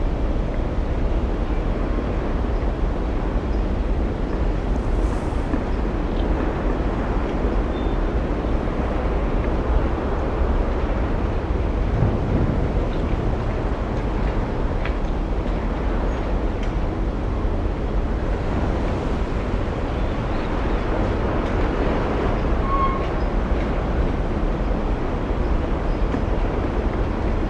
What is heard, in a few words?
Wind town